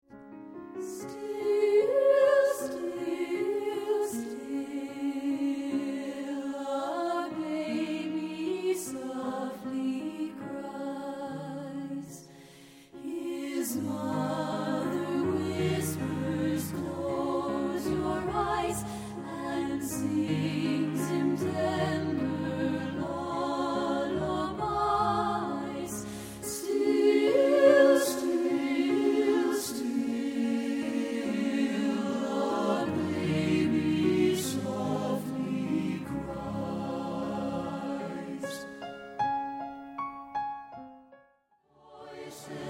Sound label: still still SATB